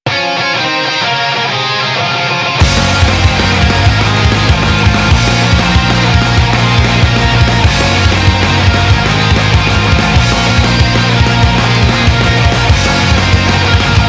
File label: Instrumental; Yu-Gi-Oh! 5D's